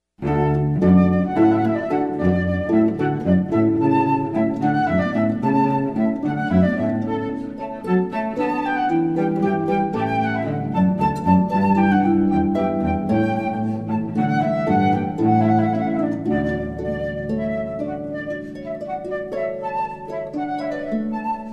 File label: hornpype